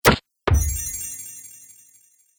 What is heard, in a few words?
Archery Magic Shot, Bow And Arrow Sound Effects